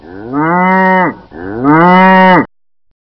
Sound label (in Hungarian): tehen